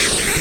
SMASH, anime sound fx, comic

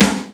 GATE SN, Snare

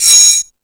Found Sound, E-MU Pro-Cussion

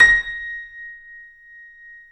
C7; East West; The Ultimate Piano Collection

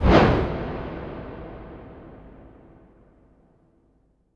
Impact Wipe, Cinematic

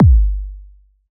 kick machine; kicks

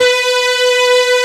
STRINGS B3; E-MU Formula 4000 Series Vol. 3; Analog Odyssey; Synth Builder

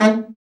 BRA BARI, Bariton Sax

